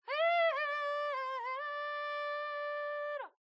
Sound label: Kulning